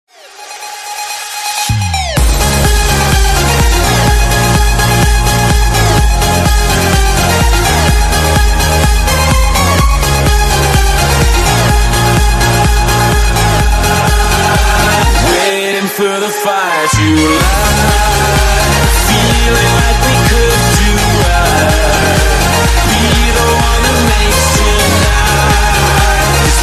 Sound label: under control electro